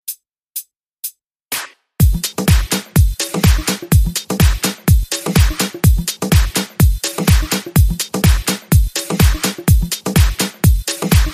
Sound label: In Control Beat